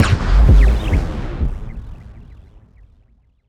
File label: hit wave massive; CrossCode; battle